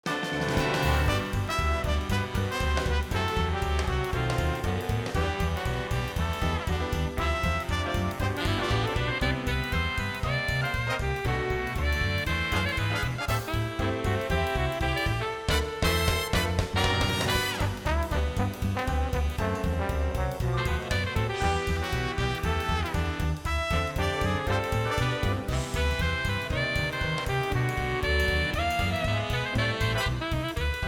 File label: BGM Quiz; PAPER MARIO Color Splash; wiiu